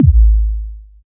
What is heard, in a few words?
bass, guns; n-gon-improved